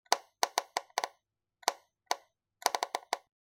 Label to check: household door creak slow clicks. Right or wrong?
Right